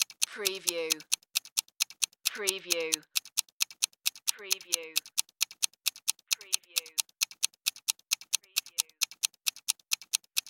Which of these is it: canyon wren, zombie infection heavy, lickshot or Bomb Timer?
Bomb Timer